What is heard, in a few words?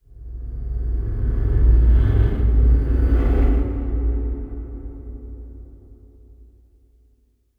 Transition Stab Dark Medium